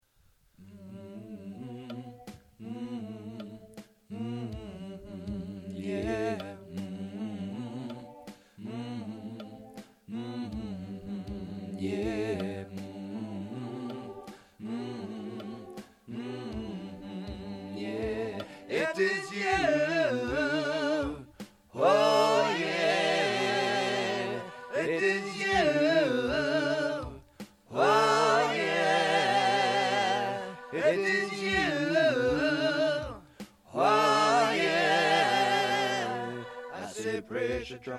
Tenor & Bass; training recordings

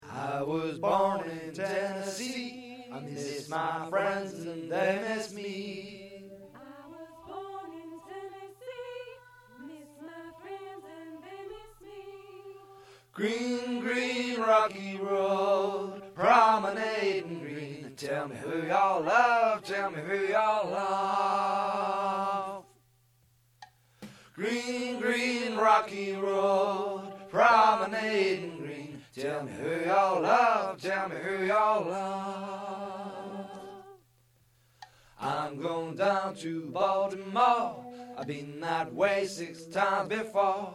Bari & Tenor; training recordings

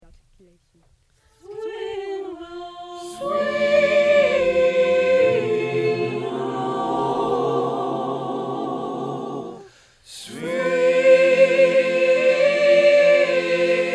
Swing Down Mix; training recordings

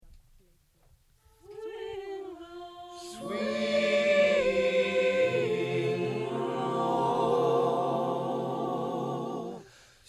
Swing Down Tenor; training recordings

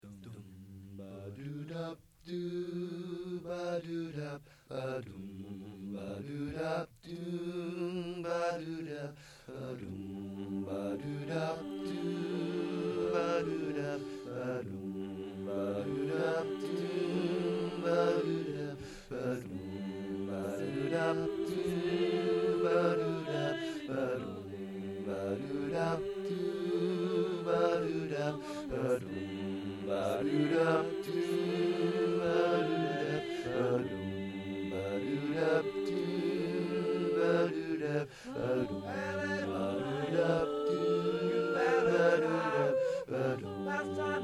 Spin Bass; training recordings

